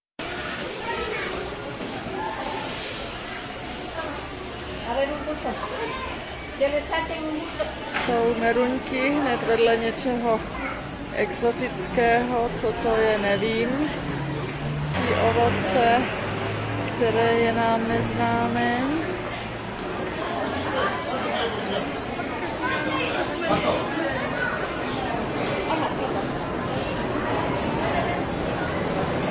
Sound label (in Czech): Quito trh ovoce; Video Ekvador